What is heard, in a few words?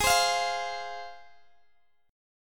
G sharp 6th G sharp down Guitar Open G, chord